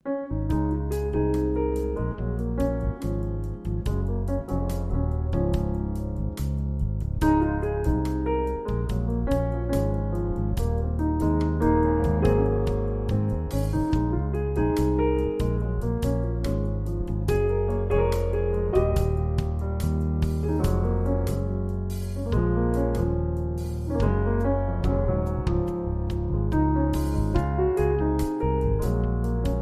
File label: With Trio